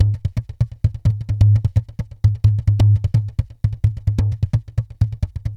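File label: tantango groove; Best Service; World Colours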